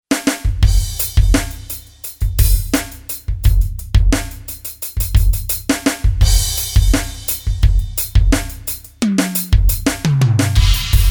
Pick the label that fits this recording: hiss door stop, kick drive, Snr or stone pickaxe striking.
Snr